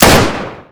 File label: ump45, weapons; gmod; usc